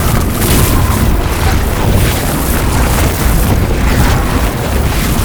Hammer Shockwave Loop; Ultimate Abilities; Unairu; Warframe; Focus

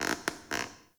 Cave Ocean Dock Creak; Ambience; Midrath Island

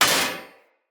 Short Sword Thrown Impact Heavy Armour

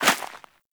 Rodent Hop Step Rear Dirt, Footsteps; Forest Rodent; Foley; Warframe; Characters

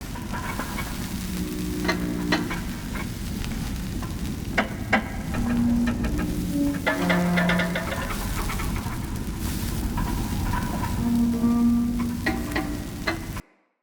Ruins Machine Loop Destroyed; Props

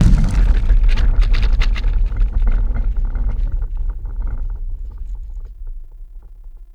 Large Cave Quake; Ambience; Warframe; Duviri; Murmur

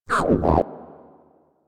Mine Osprey Attack

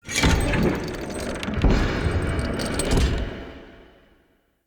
Elevator Lever Pull; UnderCity

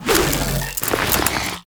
Pistol Spin; Warframe; Corpus Lich; Idle Screen

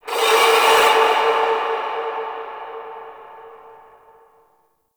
Alarm Texture, Fortress Ambience; Warframe; Grineer

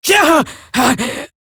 death, female; en; Eximus Grineer; Battle Barks

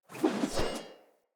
Player Sphere Polearm Whoosh Fill Long; Weapons

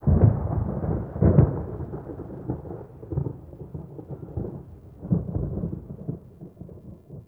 Venus Landscape Thunder Crack Low, Ambience; Warframe; Venus Open World

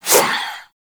Proj Throw; Hoplite Army Replicant; Warframe; Warframes

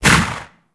Shot; Warframe; DS Anniversary; Lato Tekna

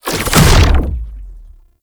Stab Cloak Enter; Enemies; Abilities; Warframe; Tau; Dax Ninja

